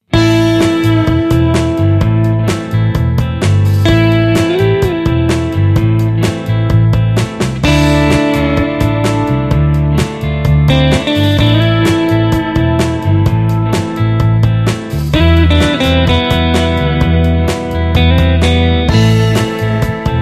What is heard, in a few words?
Old School Beat